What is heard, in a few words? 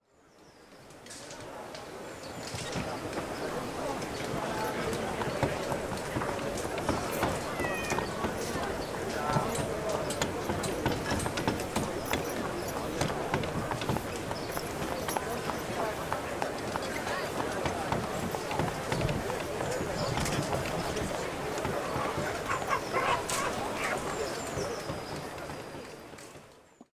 Market Soundscape